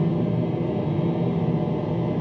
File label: Freezer; zps; Ambient